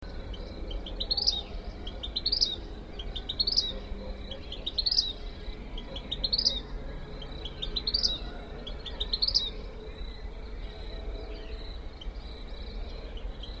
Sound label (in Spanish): Churrinche, Pyrocephalus rubinus, Vermilion Flycatcher; cantos